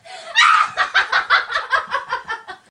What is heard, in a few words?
Evil Laugh